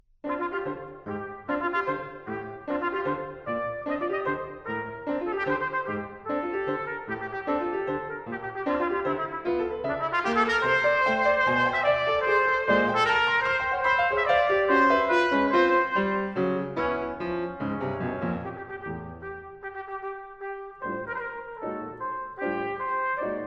Divertimento For Trumpet, Trombone, & Piano, Presto